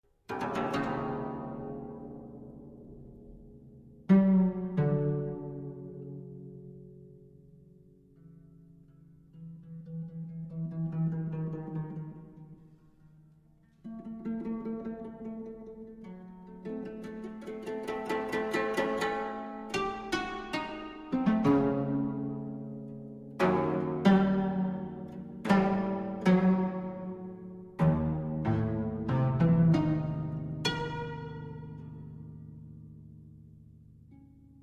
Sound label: koto